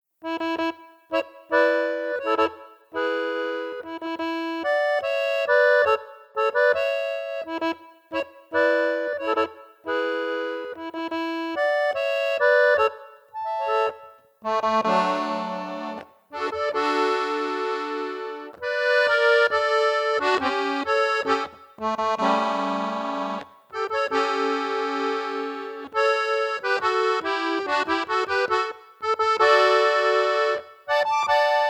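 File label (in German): TA3000eco Volksmusik, Akkordeon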